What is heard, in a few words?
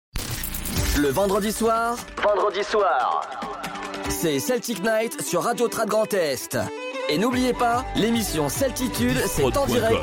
Radio Jingle Celtic Night; Radio Trad Grand Est